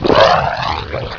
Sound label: gurp; quake2; ontra